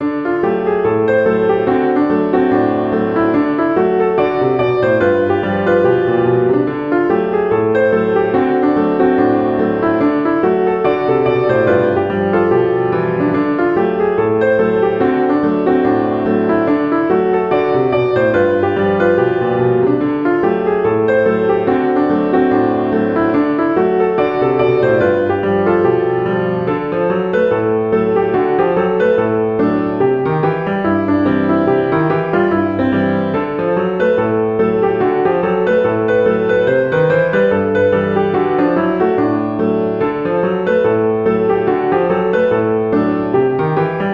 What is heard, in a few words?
Old Bell Cow, piano